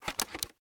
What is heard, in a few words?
Console Open; Game rip; MiSide